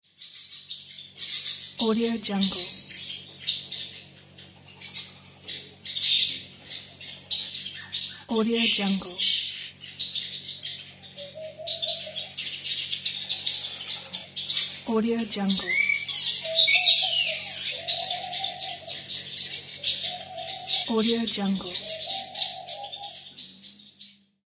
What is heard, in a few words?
tropical birds; nerso-77